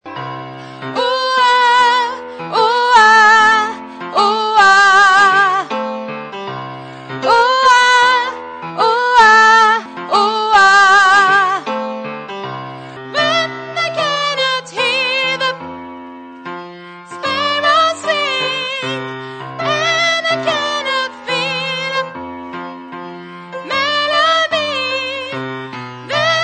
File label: tenor